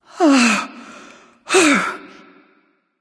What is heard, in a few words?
breath; smite; pele